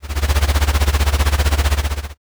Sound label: helicopter